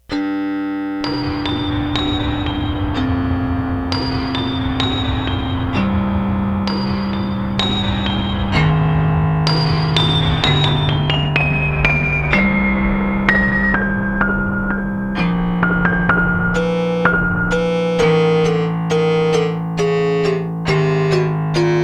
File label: strummed piano unprepared piano